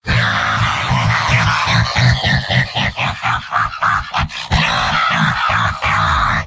heavy laugh long; mvm

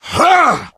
melee swing, player survivor voice

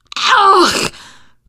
reaction disgusted, teen girl, survivor voice; source engine; left 4 dead 2

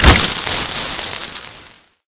impact flare; video games; source engine

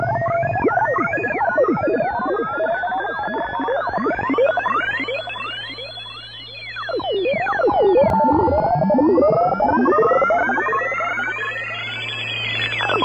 inside radar, ambient lair; video games; Source Engine; tf

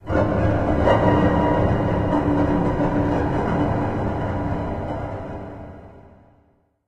infection, music; Left 4 Dead 2; Source Engine; video games

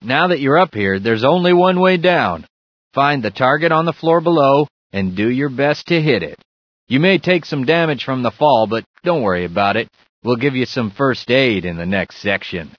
holo jumpdown; source engine; bshift; ba holo

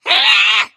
spitter spot prey, voice; source engine; left 4 dead 2

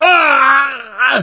choke; source engine; left 4 dead 2; namvet

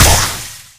hit; 3rd degree; video games; source engine; tf; weapons

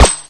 pl gun, weapons; source engine; hl1

